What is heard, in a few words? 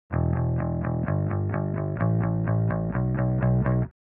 fender bass